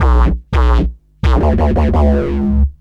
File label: Fuzz Bass, Drum & Bass; Fluid Dynamics